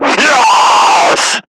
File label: Screamer Pain; Warframe; Lasrian